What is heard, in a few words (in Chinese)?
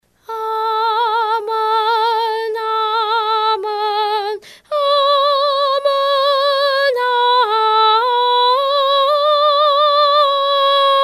七叠阿们人声